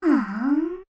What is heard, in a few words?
sighing; midna